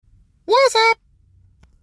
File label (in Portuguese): Mensagem; Whatsapp